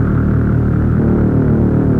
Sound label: monodelay D#m 129, Recorded with monotron delay and monotron - analogue ribbon synthesizer; instruments; loops